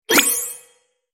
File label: Cartoon confirm button